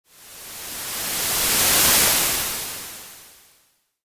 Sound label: noise welle